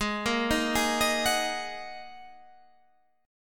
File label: A sharp 7th Suspended 4th Sharp 5th G sharp